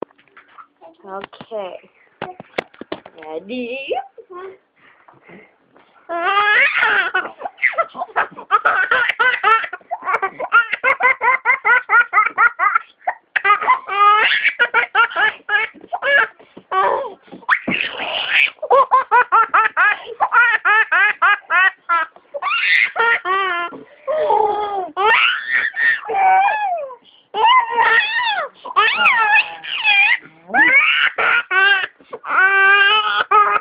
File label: laughs